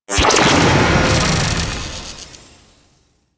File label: Cloaking Ability; DE; Warframe; Weapons; Archwing; Powers